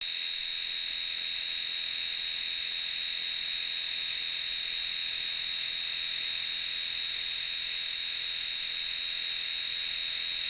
philips powertouch

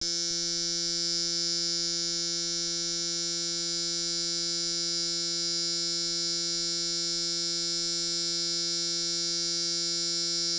braun series9 sound

